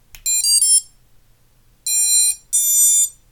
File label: ESC Startup Sound